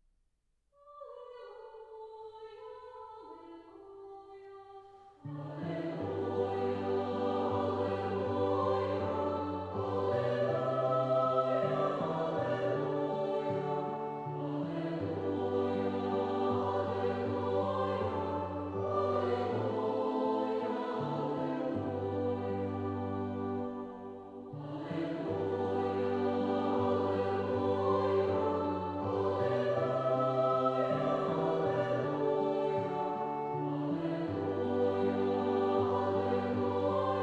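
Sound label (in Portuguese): Aleluia 4 vozes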